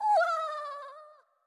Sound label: Death; Winkubus